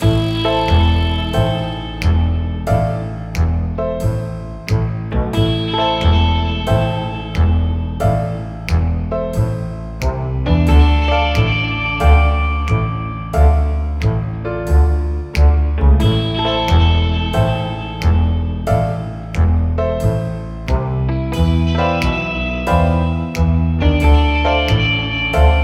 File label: Cat Swing; 60 seconds official soundtrack; android ios macos ps4 switch windows xbox 360